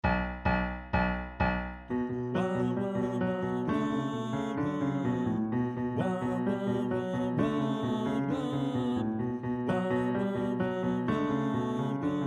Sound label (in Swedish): sopran